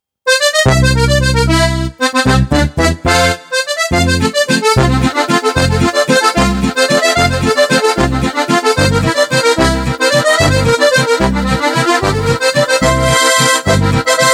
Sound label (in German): Steirisch